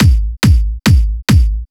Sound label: Kick, 140 BPM; Pitched; Vengeance Samples; Vocal-Hook Kits; Song Kit; Break The Walls